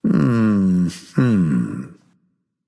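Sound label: non verbal agree, voice; cernunnos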